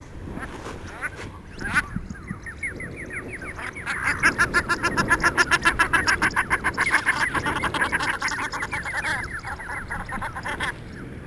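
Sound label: peganka golos, common shelduck voice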